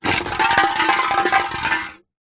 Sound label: food drink soda can empty spin concrete ground